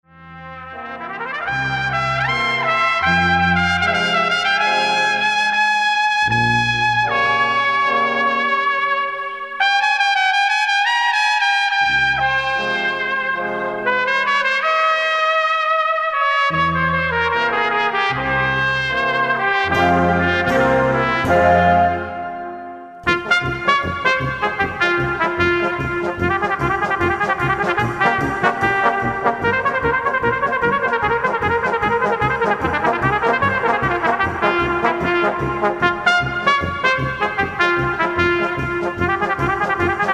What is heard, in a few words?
Czardas